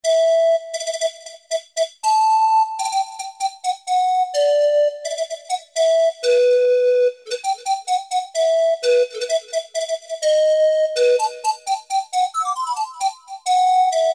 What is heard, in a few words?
WN Pan, Yamaha TG33; Factory Presets